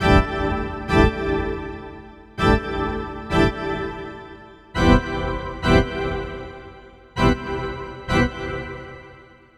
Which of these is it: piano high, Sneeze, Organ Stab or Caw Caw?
Organ Stab